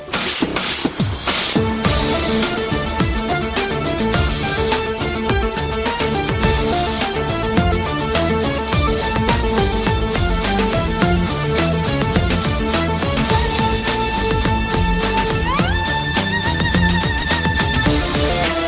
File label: Toccata